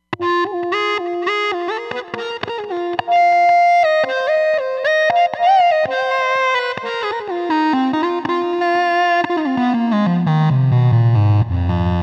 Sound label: Feat Lead, Roland Fantom XR